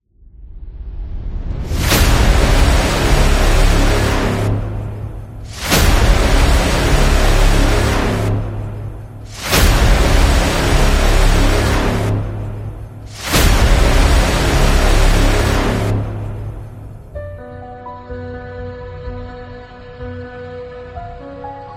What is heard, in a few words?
Suspense Intro; monster attack